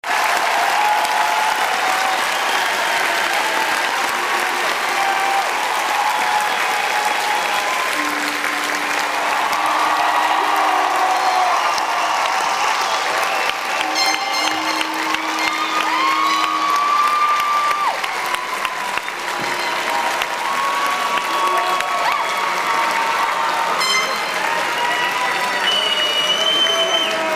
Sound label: Crowd Cheering